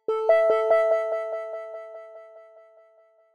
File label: ringing; session desktop